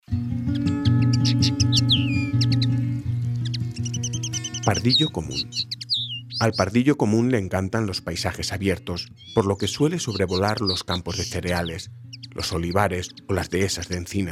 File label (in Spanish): pardillo, aves; lavanderas